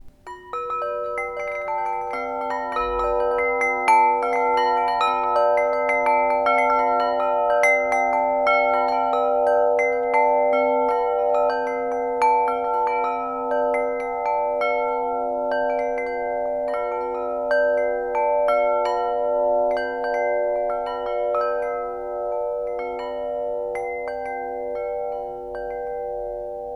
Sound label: Solfeggio